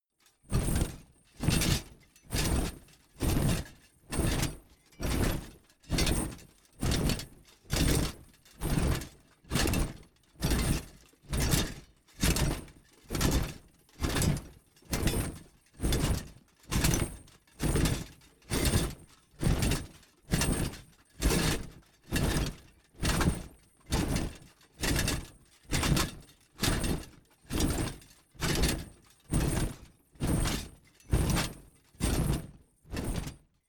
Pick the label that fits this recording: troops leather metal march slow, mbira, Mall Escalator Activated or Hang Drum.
troops leather metal march slow